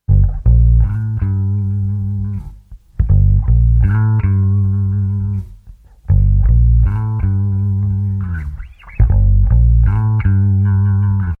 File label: Comp Bass Original; GAP Comp 3A